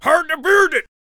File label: King Attack Vocals